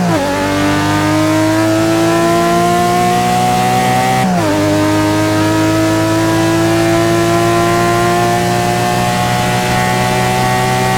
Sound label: third, vehicles cars; lwcars